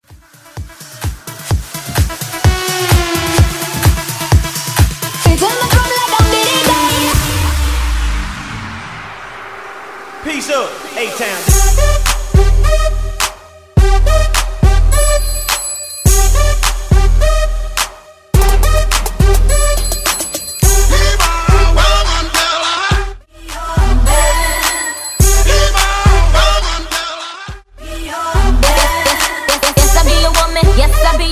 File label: EDM Banger